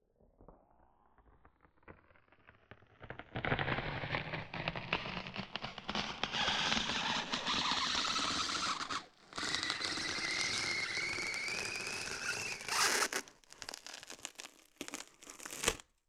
Polystyrene, Designed, Slow, Pitch, Up, Rubbery, Squealing, Squeak, Snap, At, End; Submerged Tapes; Polystyrene Destruction